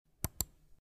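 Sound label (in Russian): звук щелчка мыши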